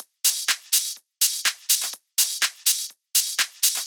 Loop Addon 124

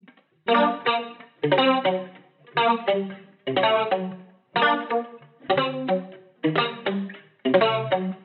guitar loop 120 Gm; chaka

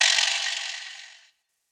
Boom Bap Vibra Slappy

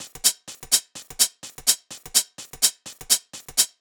126 Hats

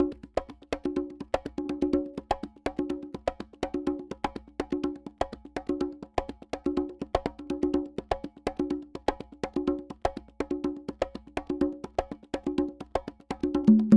Conga Swerve, 124

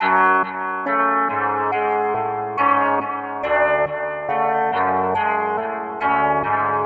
140 melody loop F-m; tempest alt

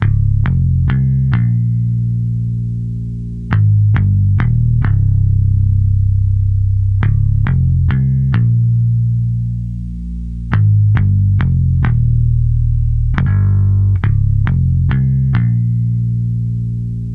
Bass Guitar C 137bpm Vintage Vinyl

